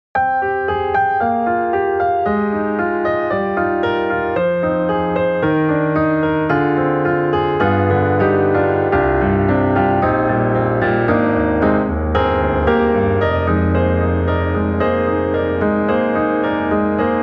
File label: Keys Studio Grand Indie Preacher D; XLN Audio; Addictive Keys